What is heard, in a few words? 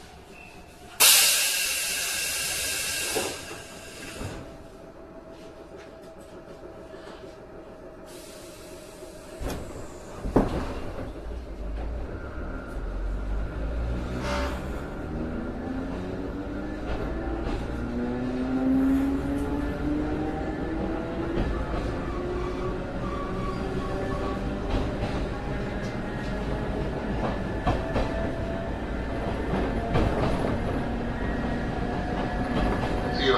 tobu 5050